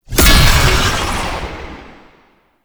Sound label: Knight Plunge Ability Cast; Warframe; Tau; Tau Dax; Abilities